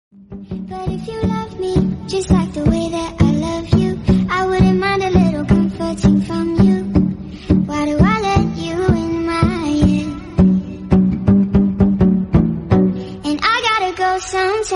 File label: nightcore